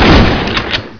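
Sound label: shotgun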